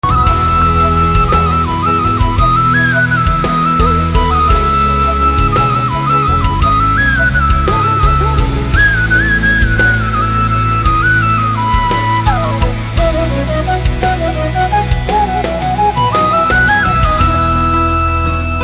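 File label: flute background music ringtone; Most Eligible Bachelor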